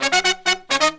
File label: Phantom Horns, 132BPM; 90 s Sample CDs; Zero-G